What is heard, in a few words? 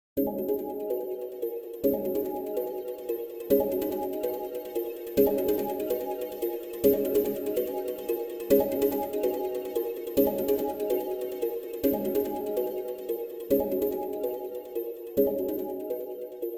Space background music; Nokia N79; Audio themes